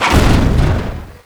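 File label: crowbar hit, weapons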